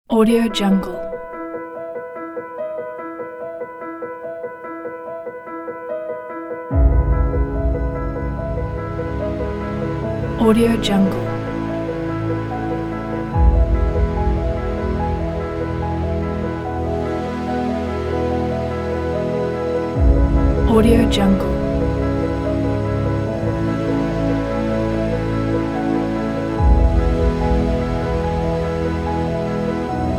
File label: inspiring piano epic cinematic